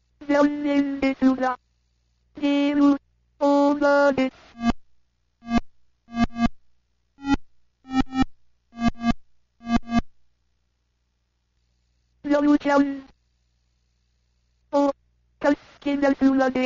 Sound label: gameover